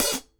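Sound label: open hi-hat Acoustic Stomp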